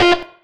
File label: acid synth (F) dry; Sonic Foundry (Sony Creative Software); Ugly Remnants VOL-2